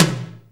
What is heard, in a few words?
PWR TOM MD; Back In Time Records; Big Bang